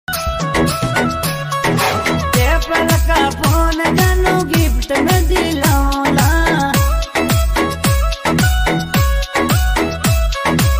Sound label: Rajasthani Ringtone